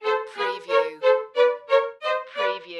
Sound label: Music Strings Ascend